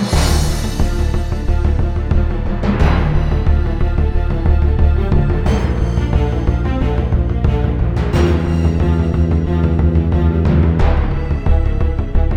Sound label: music; spiritheroes